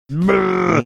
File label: making angry disapproving sound